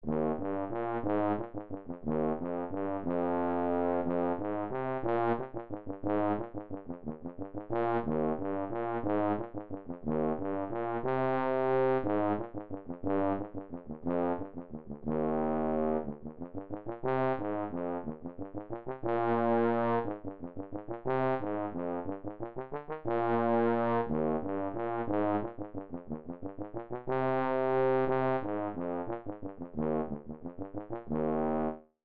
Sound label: tuba etude, 60